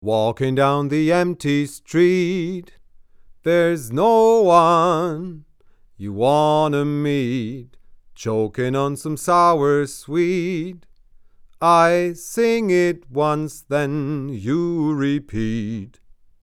Male Vocal Niere 25 cm; Universal Audio Bock 251

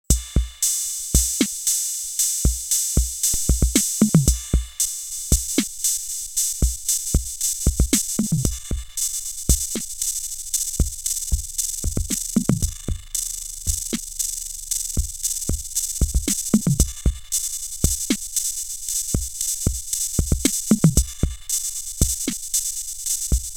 Drums mit Effekten Tremolo

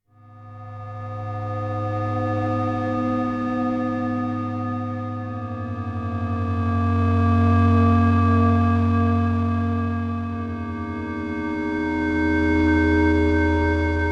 Motor Synth MkII Polyphonie 2 4; Gamechanger Audio

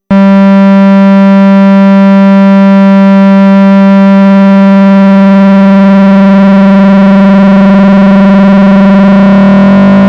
lineare FM

